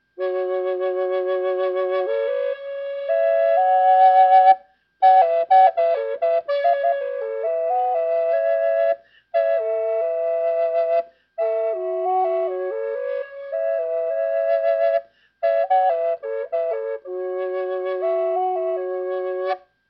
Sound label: Harmony Flute; G pentatonic; windflowerflutes